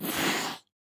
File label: Cat hissing